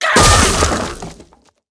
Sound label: Bomb Flinger Death